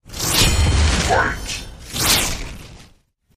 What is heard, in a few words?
boss Fight; wild worlds